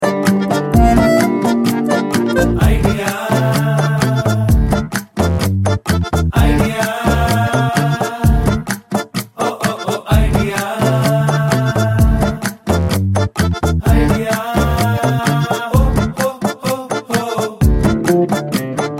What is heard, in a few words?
Theme Song Mobile Tone